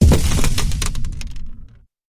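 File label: destruction crash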